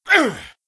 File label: Attack; Keleseth